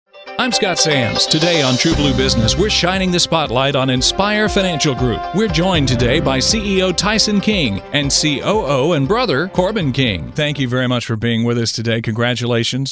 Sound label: Inspire with music